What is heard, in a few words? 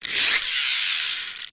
leisure rc car roll push lift wheels spin mechanical whirr short